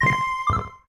weird beep sound; chibi robo photo finder

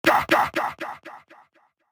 whipper death

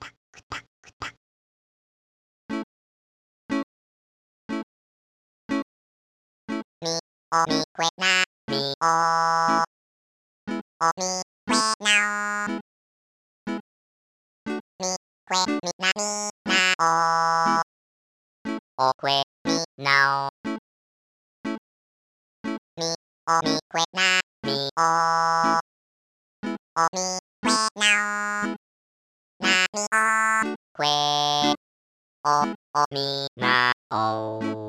Reggae (Live); animal crossing gc rip

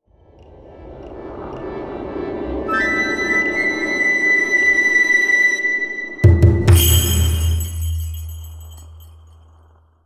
Jingle The Curtains Rise; Ore no Shikabane o Koete Yuke Complete Soundtrack

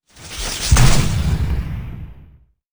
Bio Plasma Shoot

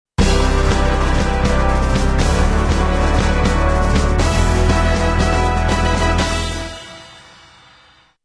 Victory, Defeat; fortress destroyer 2015

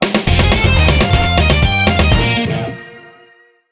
Excavation Success; Spectrobes: Origins; Wii

